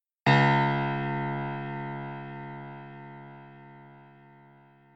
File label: c#1, piano